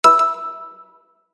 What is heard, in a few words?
HTC Wildfire S notifications